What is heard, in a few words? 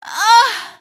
pain, female; npc; Garry's Mod; hl2